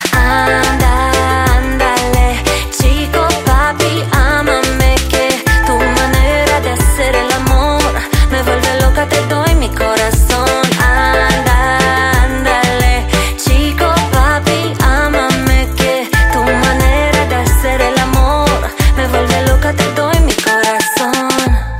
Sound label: Is Calling Suspense